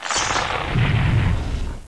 RUMBLE; ICEBREAK

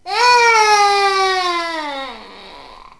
baby; SCALA

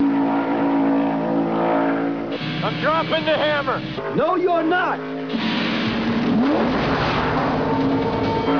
hammer

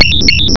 beep

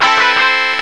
3 LINE; NETRISFX

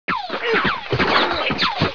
EXPLO

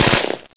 HELIGUN; SHELL

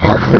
chomp

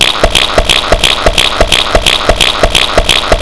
POP MACH

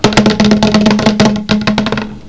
drop lots

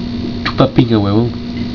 remote; WICKED

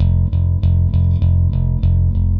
BASS, ROCK POP; MAGIX

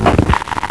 pop

